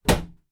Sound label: household bathroom cabinet door close